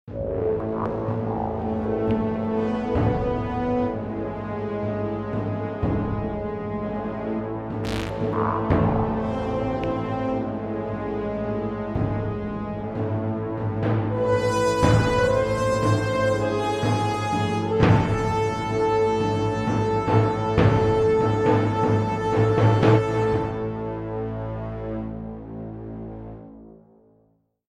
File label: Game Over MUSIC; Granny 3; Android